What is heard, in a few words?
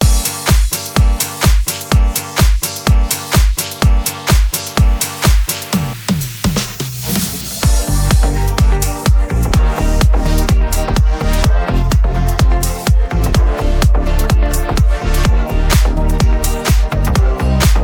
instrumental